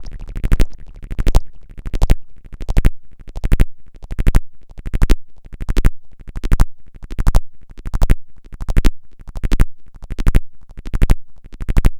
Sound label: Drum roll